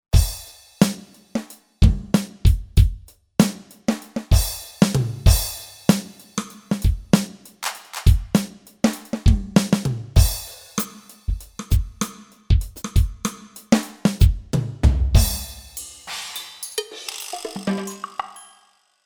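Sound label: Drum Standard Kit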